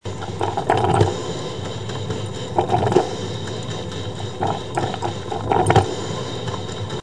cafetera filtro

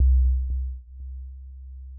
suave profunda

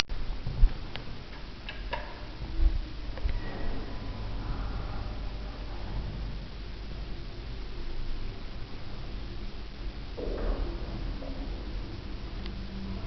puertas, sonidos

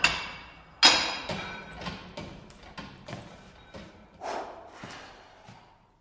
entrenamiento

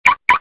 trabar carro alarma auto

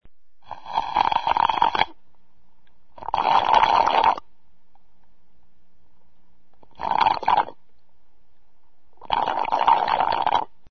Agua vaso beber vaso

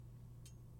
tambores

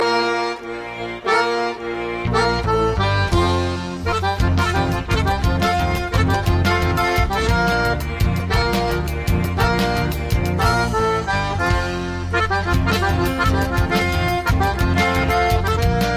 chamame

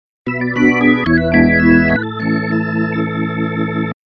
hammond organo; nokia

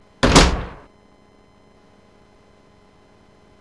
azotar puerta

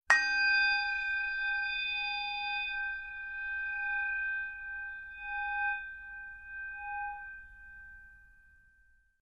campanas tibetanas tibet

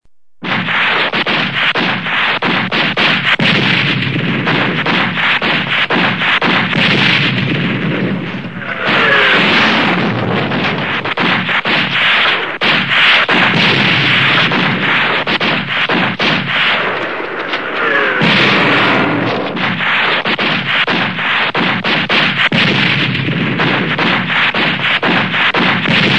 Sonidos batalla

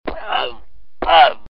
golpe pelea

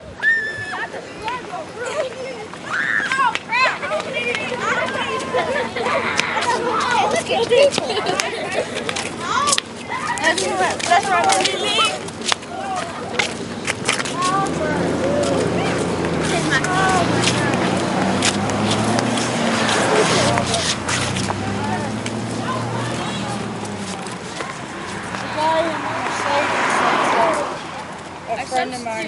autobus

